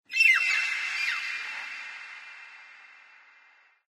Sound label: animal call bird weird; Source Engine; tf; lair